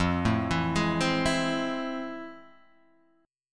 F Major 7th Sharp 9th, F, guitar; Fmaj7#9 arpeggiated; JGuitar

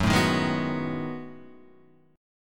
B 13th Gb, chord

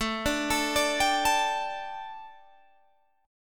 A 7th Suspended 4th A, chord; A7sus4 arpeggiated

